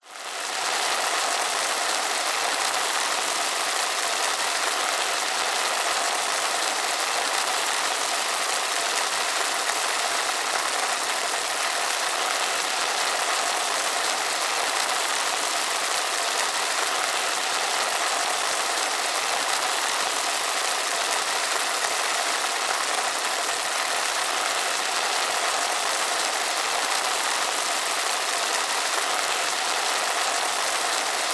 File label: mince meat cooking in pan sizzle fry